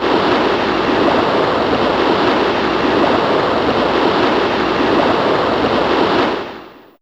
Sea, Fairlight CMI IIe

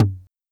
Tom Low, Fricke MFB 512

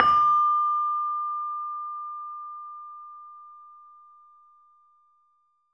LAMEL D5 -R; USB Soundscan vol.60; AKAI; LAMELOFO

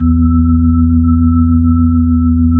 B-3 Chorus

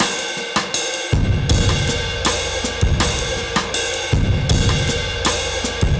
Drums Alt 80, 80bpm; Loops n Lines

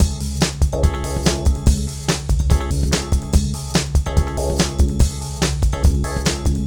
GALACTIC KIT, PROCESSED ACOUSTIC DRUM KIT; Sonic Foundry (Sony Creative Software); Vir Unis Infusion

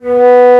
Flute mf; Multisamples; Digital Sound Factory Virtuoso

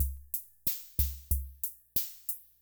Kay R-12 Rhythmer Loop, Drum Machines

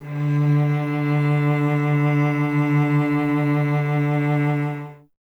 STR cello D, Cello; Miroslav Vitous; Solo Instruments VOL-1

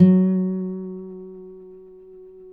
Finger F#3 L, Guitars; Spectrasonics; Hans Zimmer Guitars VOL-1

